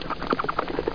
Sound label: bats; dosgames; roc